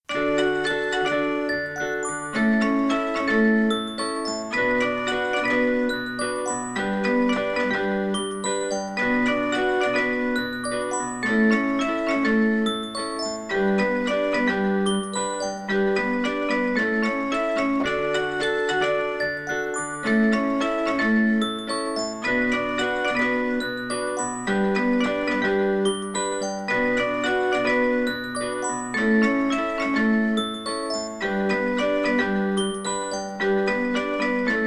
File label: Backgroundmusic